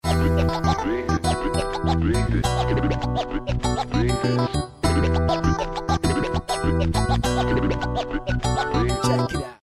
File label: Scratch